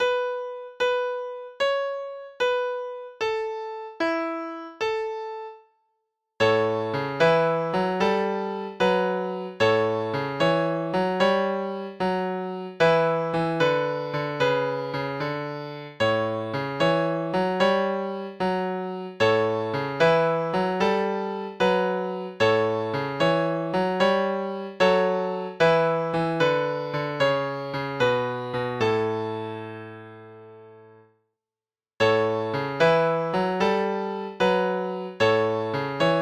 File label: blues mary on A